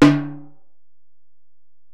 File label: Bell, Alesis DM-PRO